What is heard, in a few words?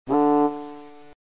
boo